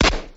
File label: hit; gungang